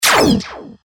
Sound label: Laser Beam